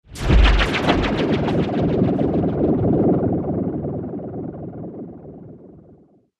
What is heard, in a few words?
Cinematic Hit Alien Whip; sound design; Hit Sound Effects